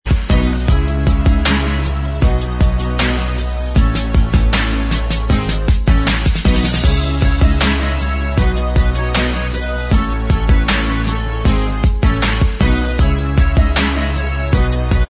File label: Inst